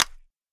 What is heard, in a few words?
multimedia button click; sound effects one